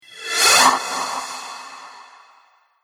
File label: Reverse Cymbal Whoosh; Whoosh Sound Effects